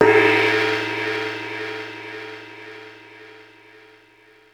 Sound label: Gong, EMU E-Drum